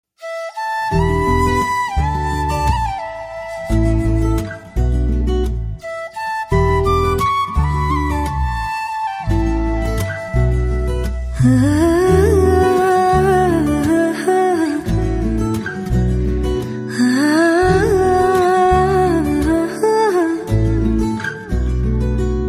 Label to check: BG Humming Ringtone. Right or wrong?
Right